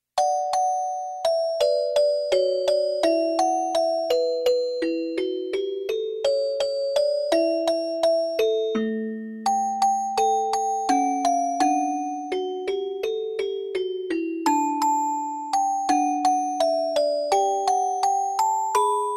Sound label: song musicbox; 米子市の歌・オルゴール演奏